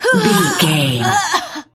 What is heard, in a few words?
voice female death